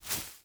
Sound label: foliage jump; teardown ps5 windows xbox series xs gamerip 2022